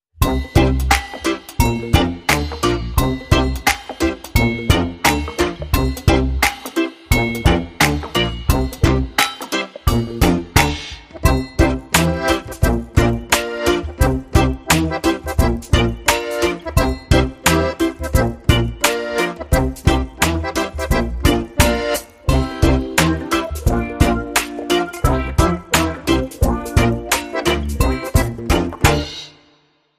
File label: Rasta Jodler, Inst